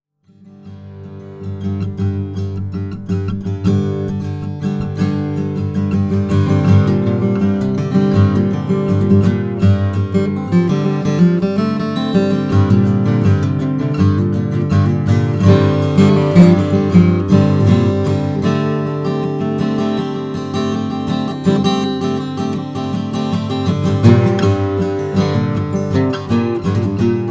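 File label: huss dalton 000 sp brazilian rosewood, guitars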